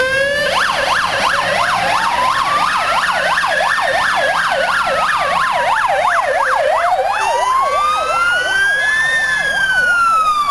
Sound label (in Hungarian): mercedes, szirena